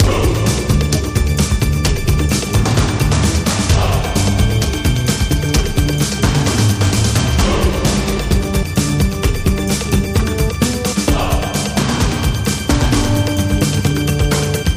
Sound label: Big Win Music Tally Loop; Tiki Mania